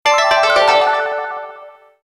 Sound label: Japanese email alert; Nokia Ringtones; Audio themes